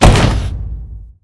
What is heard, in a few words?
metal impact